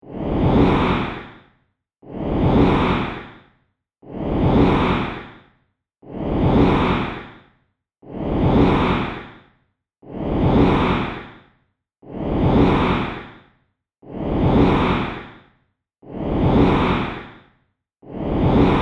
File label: Dragon Wings Flapping, monster; Monster Sound Effects